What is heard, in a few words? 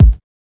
HSE-BD